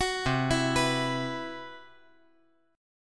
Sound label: C-Major Sharp 11th